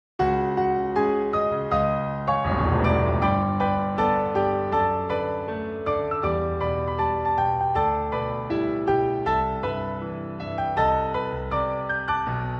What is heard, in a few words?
piano ver.